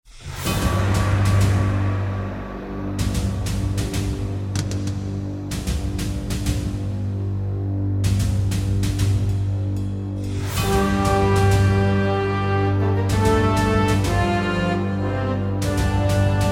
Common Defeat

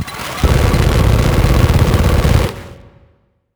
Cannon Shot; helltaker

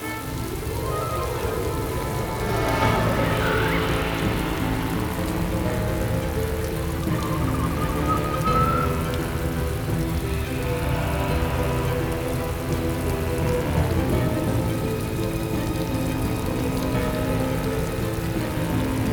Spooky Forest Combat w. Ambient; Shrek 2; GC PS2 Windows Xbox

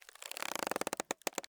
Panel Storage Plant grow; Among Us

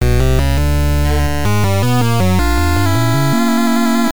Start BGM; Castlevania: The Adventure; GB

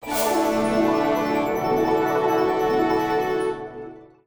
Chest Open Jingle

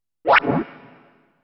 Gate Hacking Menu (Capsule Rotation); dot hack

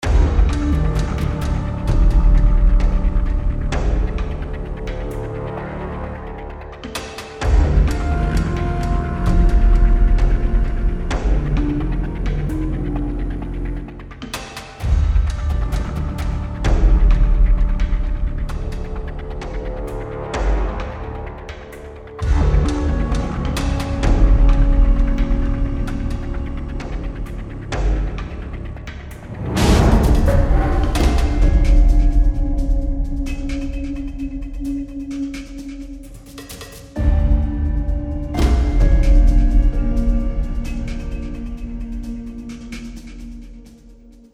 Opportunity Ambient; Borderlands 2

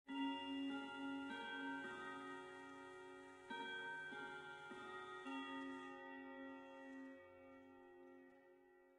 Clock

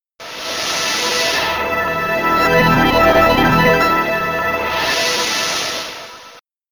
Super Jackpot, pinball

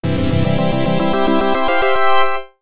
You Won the Match; WWF WrestleFest; Arcade

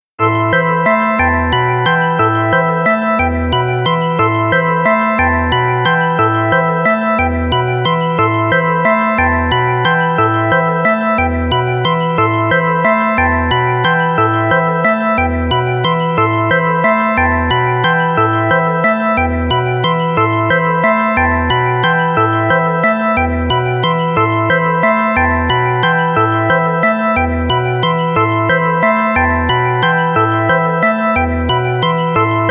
Waltz; Yumenikki sound tracks vol. 1; Yu Me No Oto

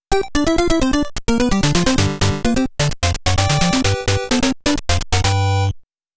all pattern clear; konami famicom chronicle vol.1 disk system edition

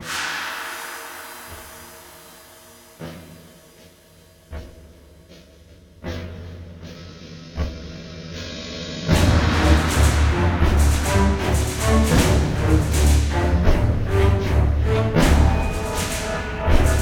Forest Swamp; Sherlock Holmes The Devil's Daughter

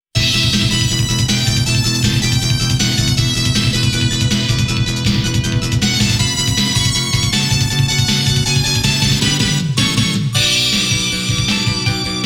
Round Clear; Night Striker S; Saturn

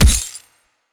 Boss Chain Kick; helltaker

